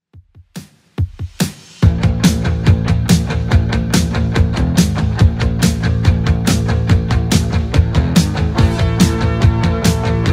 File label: 80s Rock Redrum 142bpm